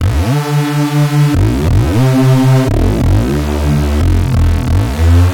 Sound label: Reesey Bass 90bpm D